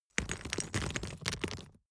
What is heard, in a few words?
stone drop small stones